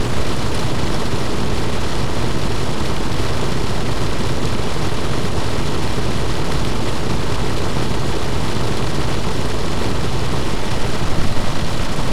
river raging

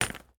mine stone

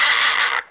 pig squeal move; fauna

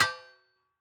mine metal

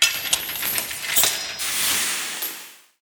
complete forge

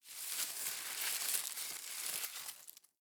leaves, foraging

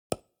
construction wood

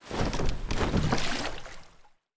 gather fish; ships siege

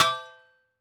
mine metal

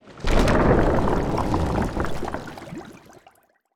warship death

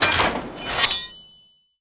barracks; interface; building

